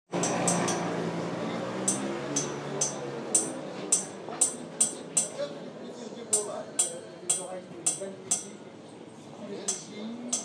bruit du souk

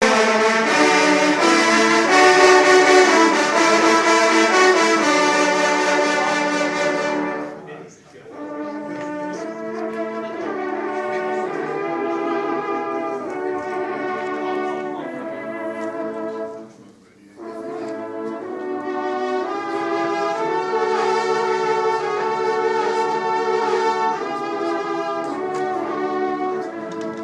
trompes de chasse parforcehorner; an der dv von jagdschweiz

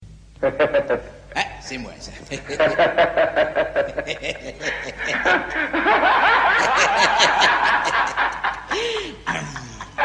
rire

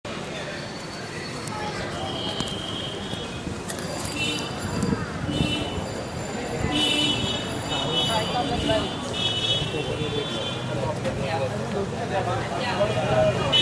ambiance dans les rues de Mumbay

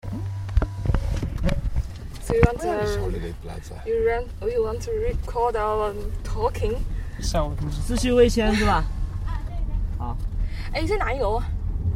ambiance sonore dans un bureau a shenzen

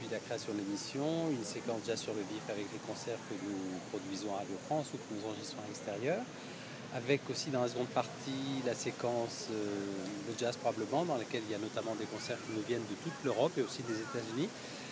jazz sur vif

